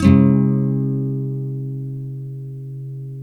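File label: NYLON, D MINOR UP, CHORDS; Spectrasonics; Hans Zimmer Guitars VOL-1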